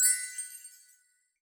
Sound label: balloon bonus success